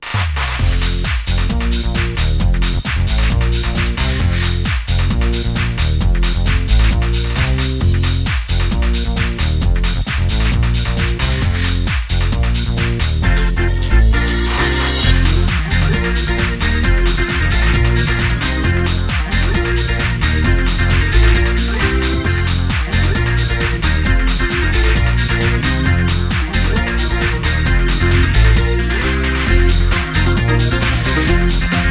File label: BGM home